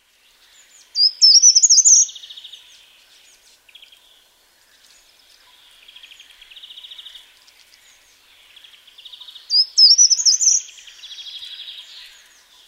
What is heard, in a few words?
short toed treecreeper